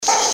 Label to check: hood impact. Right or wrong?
Wrong